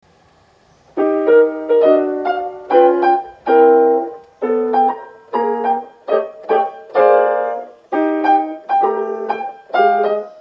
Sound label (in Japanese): 発車メロディー（たなばたさま）; ４番線は必ずフルコーラス流れます。; 阿佐ヶ谷ＶｅｒＤ; 曲は「たなばたさま」です。; ただ、到着放送の鳴動が遅いため、発車メロディーに被りや